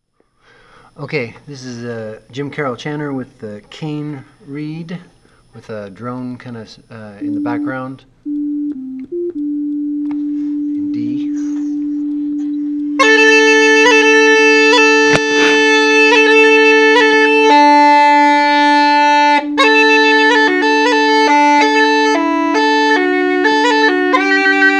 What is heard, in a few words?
cane reed